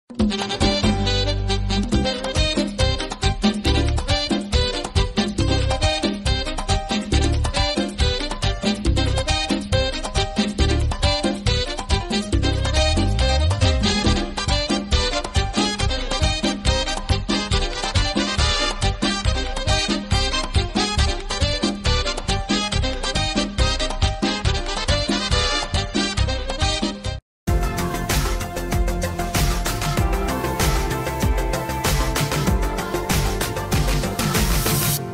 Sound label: Salsa Ringtone